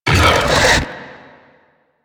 Scarab Swarm Buildup; Warframe; Mummy

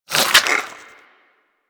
Inf Throwing Star Reload, Infested; Warframe; Weapons

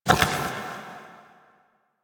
Memory Go Board Player Place; Warframe; Sacrifice quest

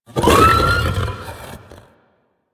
Pain Growl D; Enemies; Vocals; Warframe; The New War; Archon; Amar

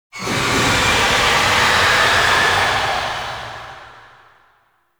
Boss Vocal Spider Summon Stinger; Warframe; Oraxia

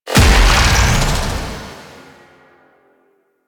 Melee Charge Explosion; Warframe; Necramech

